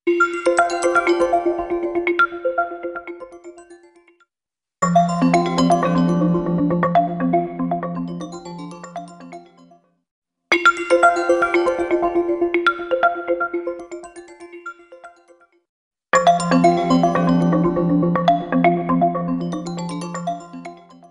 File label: Vivo S18 Pro Ringtone